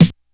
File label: boom snare drum sample g key; snare drum samples